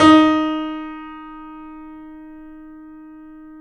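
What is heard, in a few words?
piano, D#, right; InVision Interactive - The Piano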